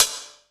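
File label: closed hi hat, 150 BPM; Drum & Bass; Ueberschall; Drum & Bass Resonance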